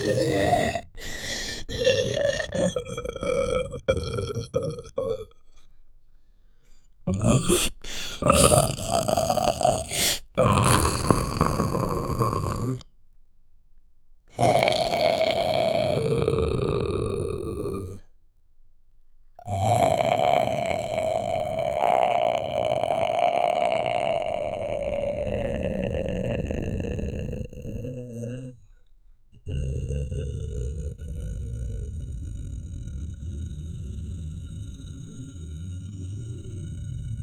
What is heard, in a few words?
sound effects of stomach